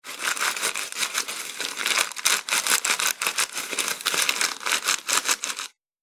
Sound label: Parcel Wrapping Paper Scrunchy Noise; Foley; Andrew Fleming